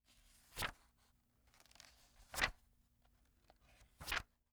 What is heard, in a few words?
Household Book Soft Cover Pages Turning